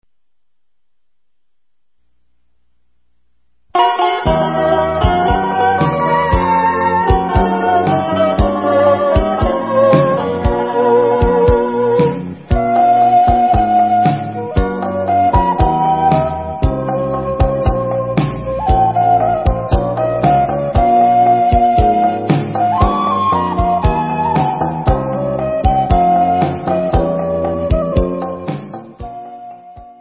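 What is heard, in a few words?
Instrumental, Israeli Dances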